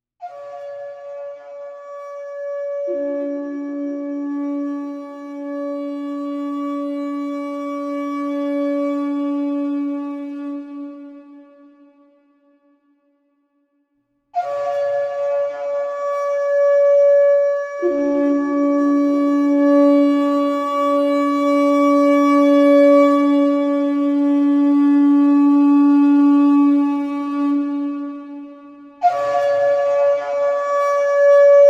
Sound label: sunrise soundscape; Sunrise Ceremony